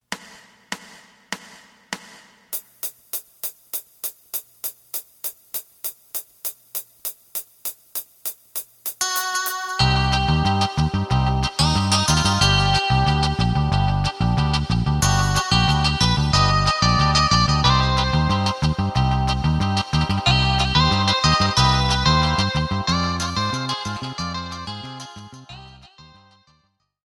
drum

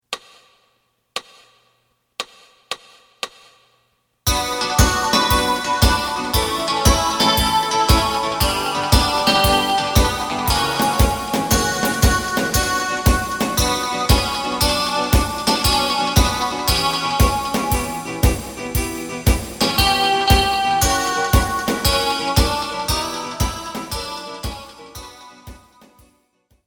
Bm bass

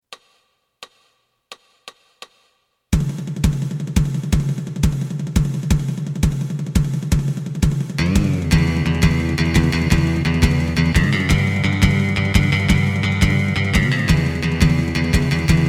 driving guitar

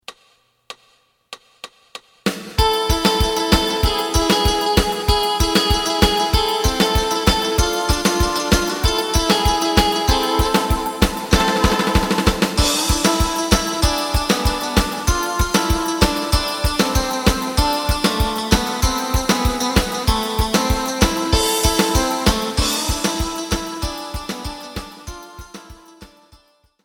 live bass

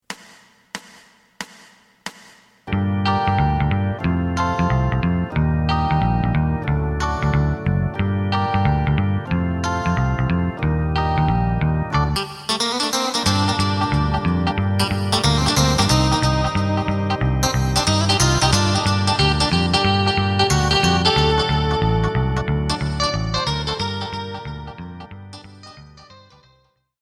Gm drum

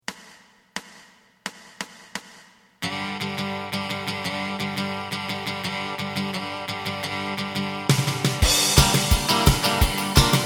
bass

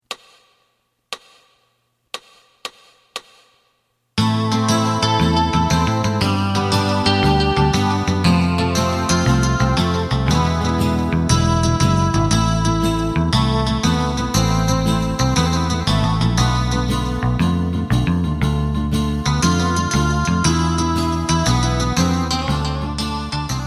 Am drum

